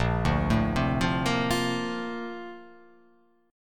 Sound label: Bb Major 9th, Bb, down; arpeggiated; JGuitar